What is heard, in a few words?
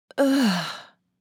Tired female moan